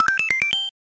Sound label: 1UP